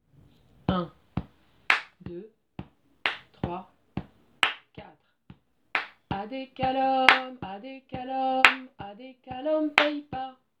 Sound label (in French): départ rythmique voix haute